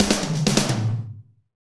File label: Medium Techno; Northstar - Drumscapes Roland